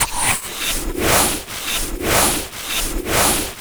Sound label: SFX, Drum & Bass, 200 BPM; Ueberschall; Drum & Bass Resonance